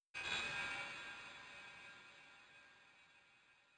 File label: Music Hub Layer erosion disto; Music Hub Layer erosion disto 06